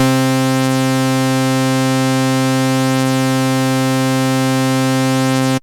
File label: PolyBass C3, Moog Voyager; Moog Voyager Sounds; VES1 PolyBass